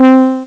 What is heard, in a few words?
Smart Brass, Amiga Soundtracker; ST-XX Amiga Soundtracker Sample Packs